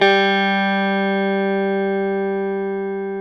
Classic Guitars; E-MU Formula 4000 Series Vol. 3 – Analog Odyssey

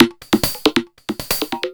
percussion loops 138; PS-45

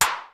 KICK SNARE; Kid Nepro; Elite; KICKS SNARES

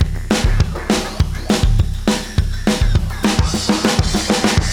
fx, Beat Loops, 203; Zero-G - Monster Beats

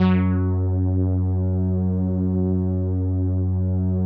505, String Machines

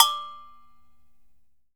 AGOGO HI LON, PERCUSSN; Big Fish Audio; Ross Garfield Drums VOL-2; GM PERCUSSN

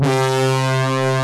LINEAR SYNTH

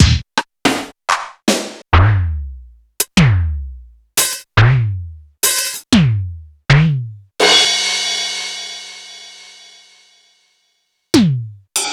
Roland U220 Electric Set

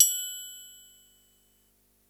triangle, Yamaha RY-8

